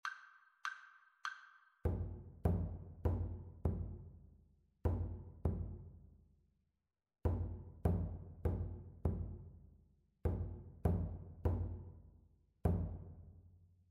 rhythmic dictation

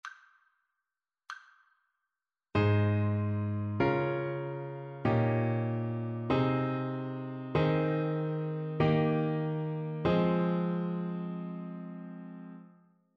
harmonic dictation

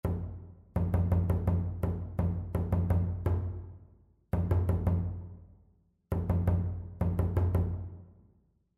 rhythmic error detection

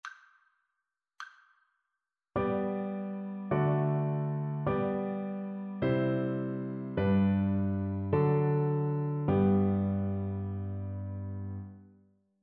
harmonic dictation

